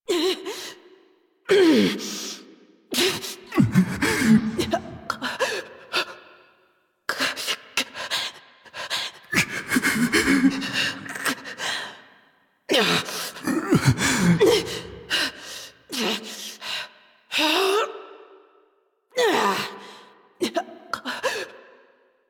Rusalka Breaths; Kill Her Killer; Warframe; NineteenNinetyNine; Stage8ShitboxConvoy

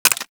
Bullet Impact Debris Wood; Warframe; Weapons; Bullet Impacts

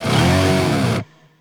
Pistol Reload; Warframe; Weapons; Tenno; Temple Signature Pistol

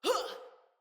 Attack Female; Battle; Envoy

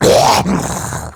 Pain; Battle Barks; Warframe; Grineer Ghoul Devourer

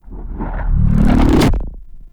Unholy Punch Cast Riser; Enemies; Abilities; Warframe; Tau; Roathe

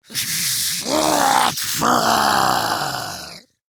Attack Grineer Ghoul; Grineer Ghoul Expired; Battle Barks

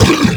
Flying Mouth Pain; Warframe; ManInTheWall

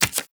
Tarot UI Card Click; Warframe; Harrow; Tarot Emotion Choice

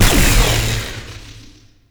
Cell Disable; Warframe; The Teacher; Mod Quest

